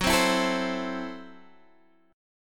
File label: F sharp Minor 7th Flat 5th F sharp Guitar Open D